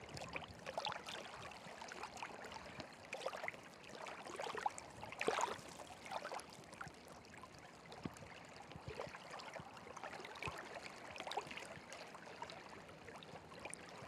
waterlap; factorio 2.0.55

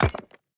deconstruct bricks; factorio 2.0.55

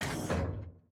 gate open; factorio 2.0.55

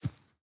grass, walking; factorio 2.0.55

